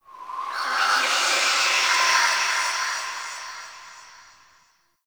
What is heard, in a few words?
Zariman Whisper Air Draft; Warframe; The New War; Ambience